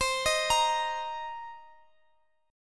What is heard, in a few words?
C Minor 7th, C, guitar chord